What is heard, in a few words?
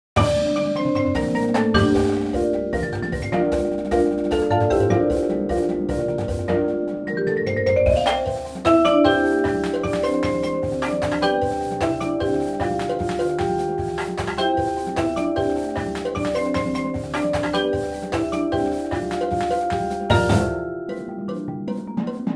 percussion